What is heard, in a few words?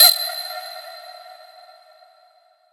Ping (Delay, Resonant)